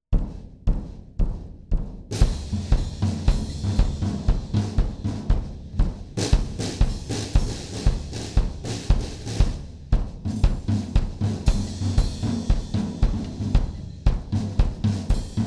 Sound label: Drum Interlude; hyper terrestrial